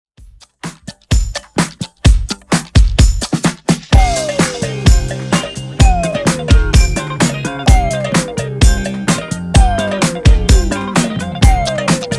Re drum

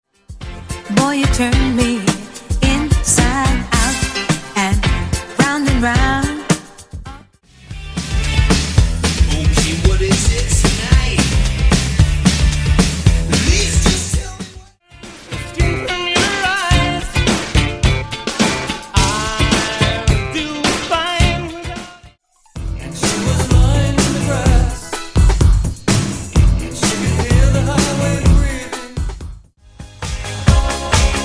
Funk 80s Redrum; Collection 108 Tracks Unmixed

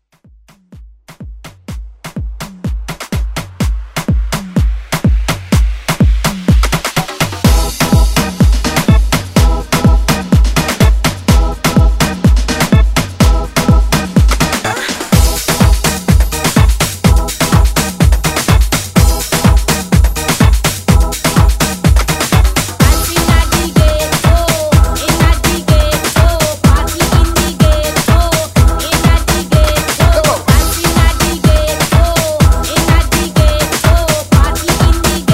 90s Dance Redrum

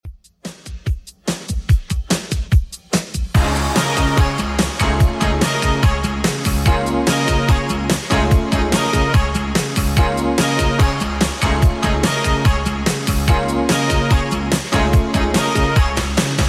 80s Redrum 148 bpm

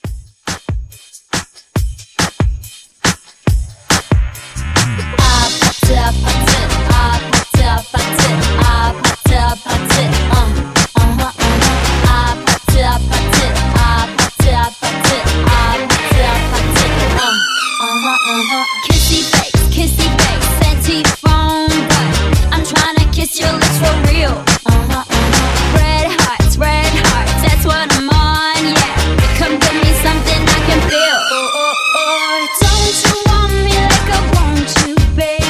Mashup 140

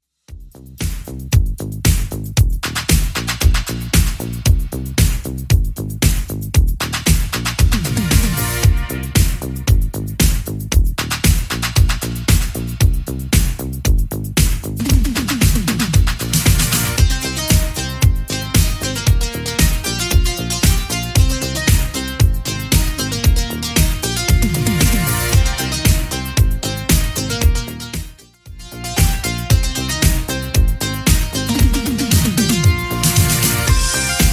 80s Redrum 115 bpm

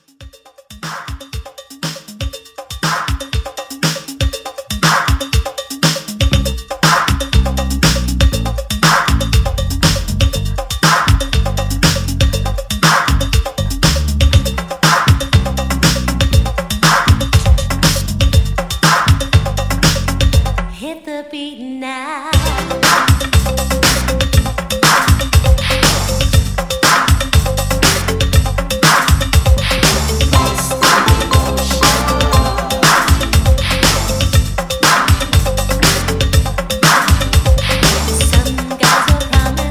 80s Pop Re Drum 120

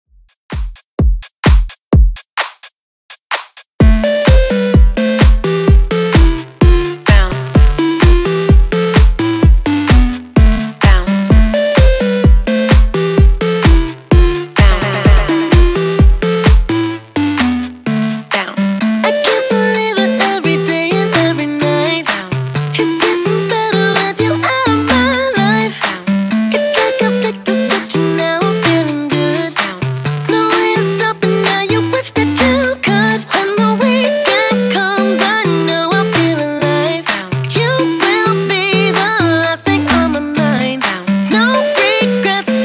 128Bpm

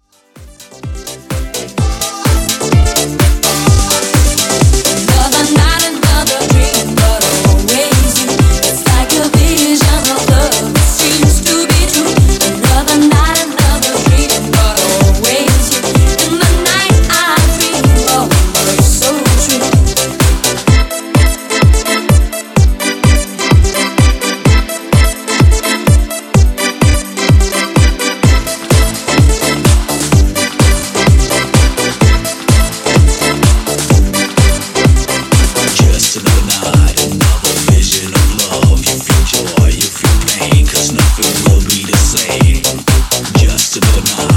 90s ReDrum 127Bpm

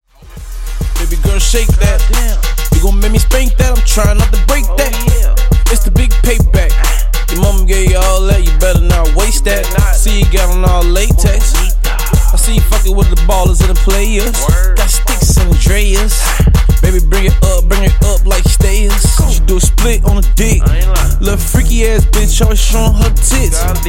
Slam Redrum 102Bpm

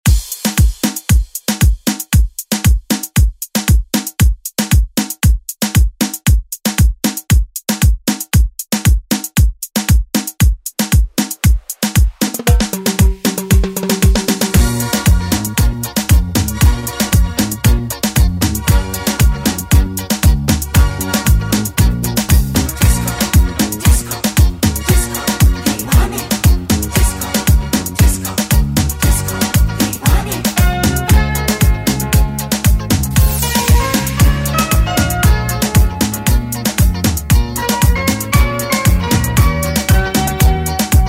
Oldschool Redrum; Deejay Hit

